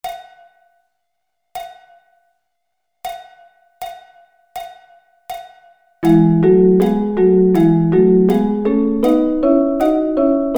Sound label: Double Stops